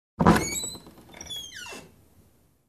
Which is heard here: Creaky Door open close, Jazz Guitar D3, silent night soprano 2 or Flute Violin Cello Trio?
Creaky Door open close